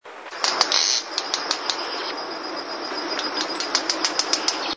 voz junquero

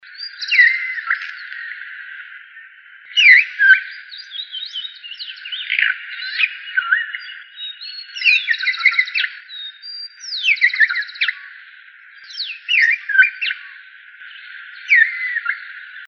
voz boyero negro

